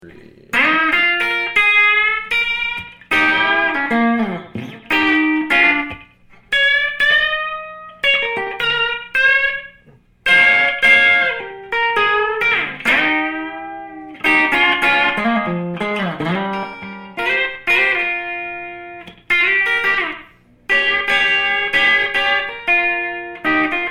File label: pickups biting blues, guitars; D Leddin